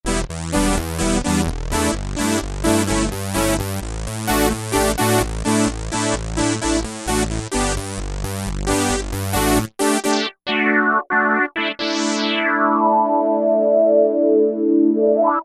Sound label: syn Swept Saw; E-mu Orbit V2; Factory presets